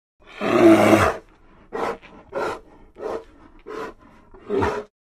Bear Grizzly Growl

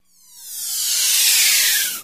Gates Door Whoosh, spaceship fx

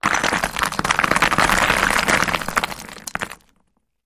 Rock Cinder Block Deb, rocks & dirt

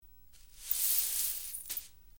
Light Sweeping Up Gla, cleaning, sweeping

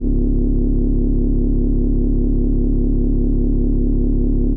engine hum, engines

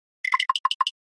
Flash Gear Hollow Tap

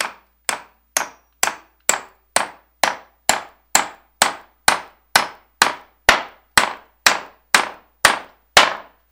Hammering Nail Wood; hammers

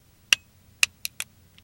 357 Magnum Gun Cock

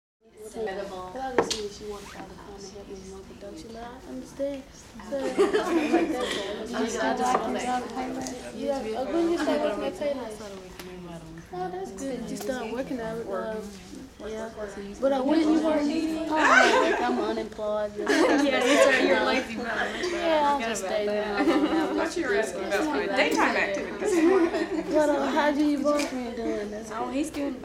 American Female Chat, chatter walla

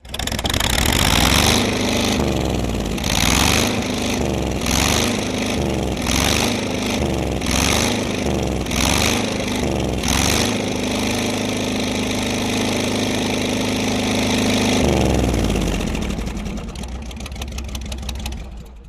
Military Vehicle Engi

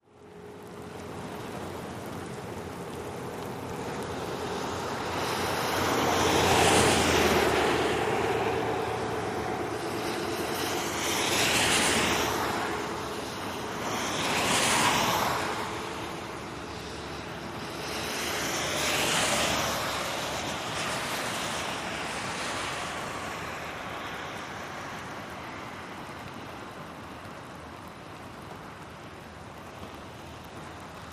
driveby rain, wet traffic

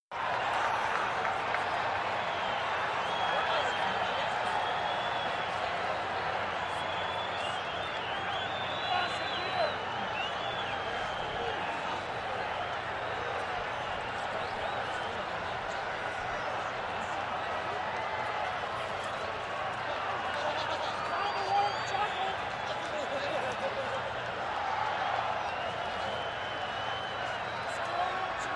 Football Crowd Stea, sports arena football

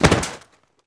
Body Falls On Cement

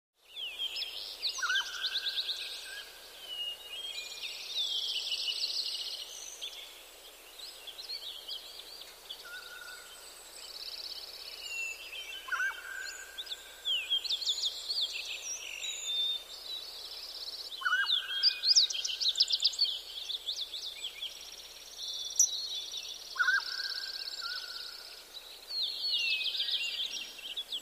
Forest Mountain Echo

